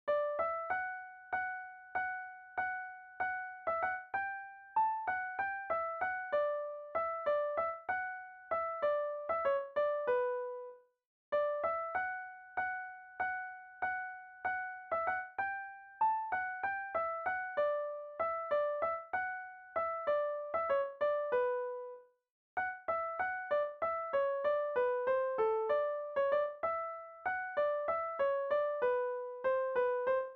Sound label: melody